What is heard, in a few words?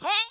jump